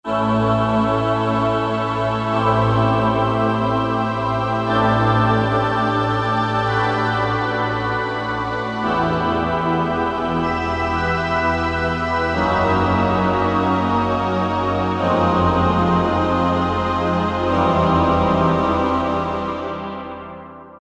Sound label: Slow Orchestra Pad; Optical Media International Sonic Images Library